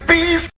bees

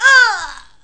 pain, female; kingpin

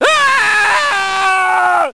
scientist scream

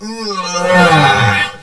die; helmet

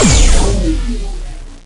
tornado shoot end; dn3d r

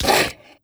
zombie attack

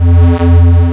reactor; dn3d r

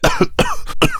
COUGH MALE; combatarms

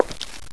step; kingpin; steps

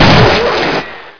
fire; justislave

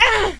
grunt; kingpin; female; fighting

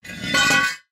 manhole; crimecity

